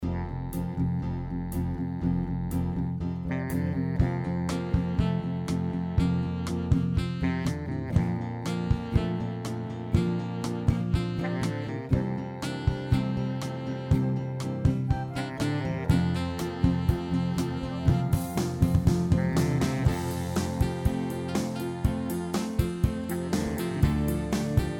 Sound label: Song Backing Track